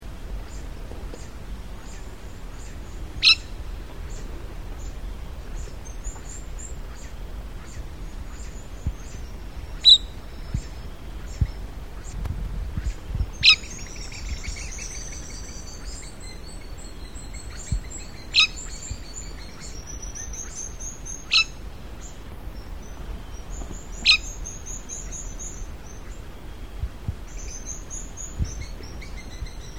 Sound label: black winged parrot